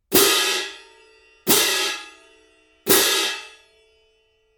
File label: 19in zildjian stadium medium heavy